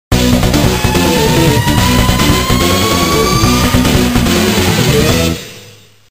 victory; Gladiators; grimm

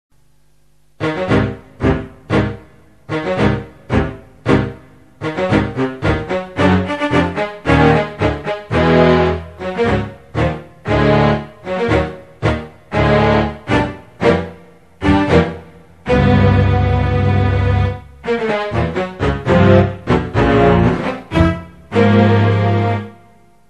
ship, music; Goblins3

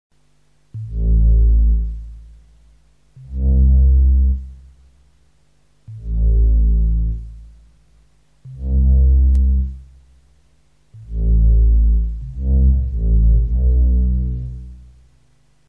country, music; Goblins3

